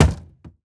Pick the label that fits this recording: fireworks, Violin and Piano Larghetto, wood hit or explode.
wood hit